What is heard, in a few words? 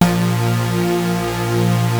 Prophet 5 F3; Hollywood Edge - Giorgio Moroder Rare Synthesizer Collection